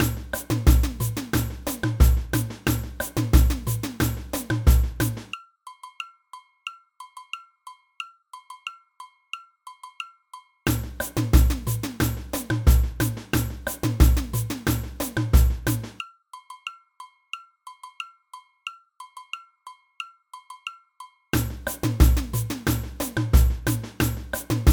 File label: agogo bells, samba; samba band